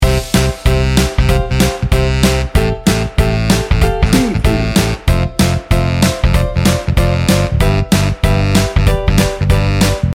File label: 60s retro soul loop